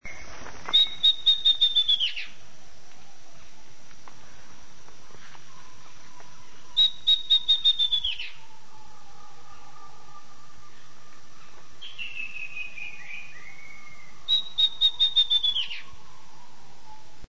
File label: chestnut tailed antbird; Peru